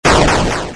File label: die sound; robot wants puppy